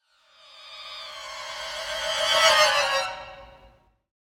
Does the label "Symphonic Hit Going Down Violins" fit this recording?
Yes